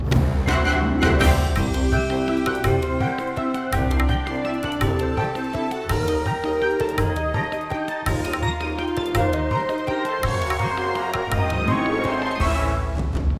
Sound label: Win Chime; microscopic rts action; gamerip; Amoeba Battle